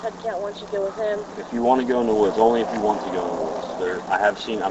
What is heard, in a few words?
unknown noise while is talking; Gettysburg Pa Culps Hill